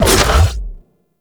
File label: bite cast; Warframe; Voruna